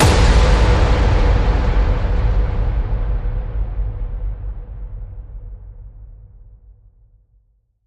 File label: Danger Slam